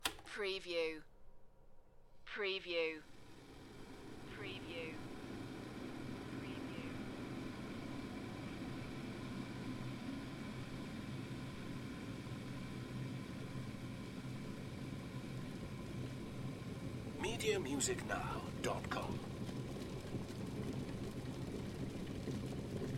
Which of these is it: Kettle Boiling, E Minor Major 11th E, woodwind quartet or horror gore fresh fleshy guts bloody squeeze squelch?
Kettle Boiling